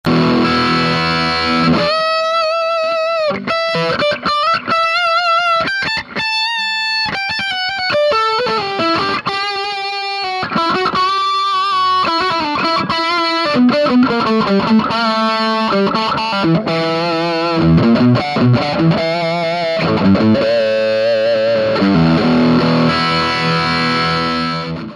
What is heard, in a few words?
ax lead tone, guitar sounds